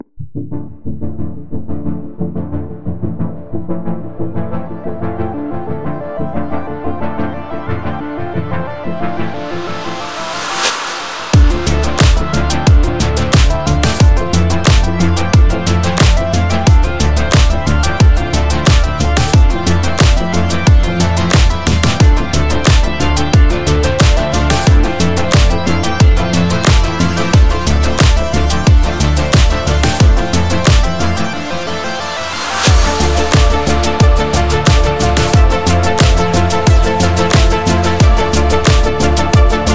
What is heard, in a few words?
Midnight Synthwave